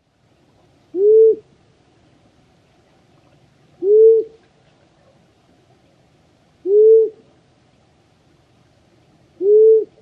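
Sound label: Long-eared Owl hoots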